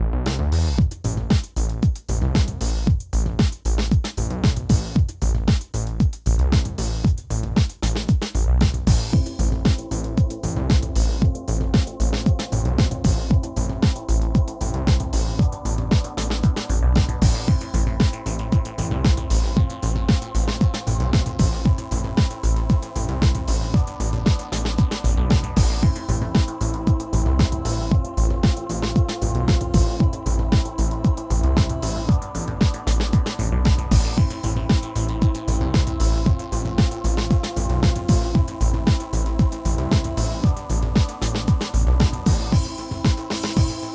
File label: instrumental ending; Nitrile mix